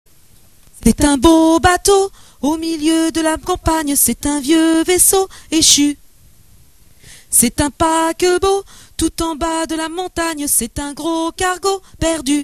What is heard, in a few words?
Bateau tenors; mon beau bateau